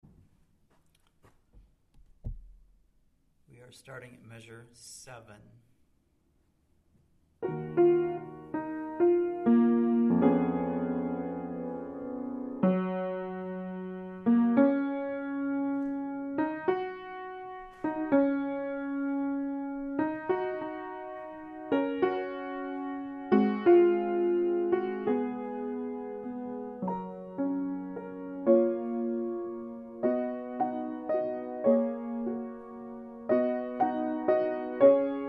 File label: piano